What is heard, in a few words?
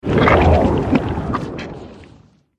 gidra buff in